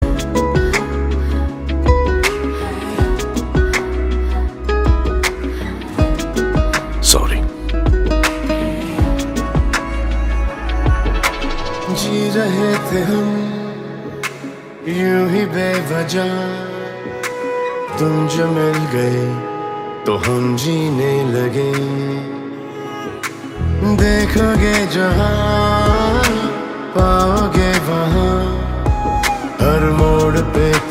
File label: Slowed Reverb